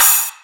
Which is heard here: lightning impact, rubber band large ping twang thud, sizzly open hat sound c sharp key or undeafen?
sizzly open hat sound c sharp key